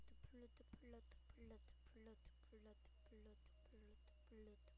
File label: flute